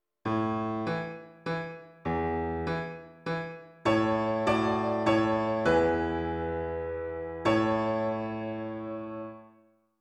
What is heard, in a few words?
Piano